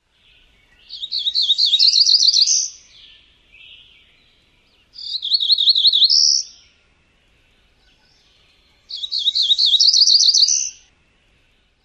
songs; NY

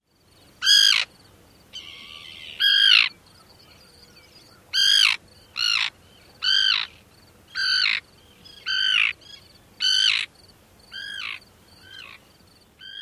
keearr calls

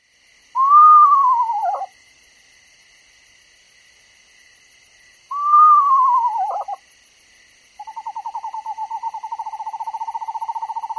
whinny amp trill

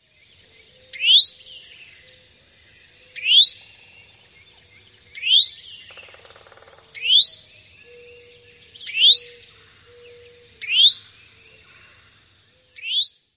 nasal calls

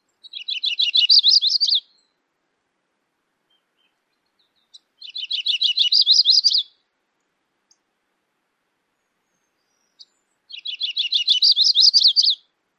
Audubon's songs

